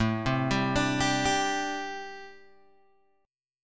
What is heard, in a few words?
A Minor 11th A Guitar Open G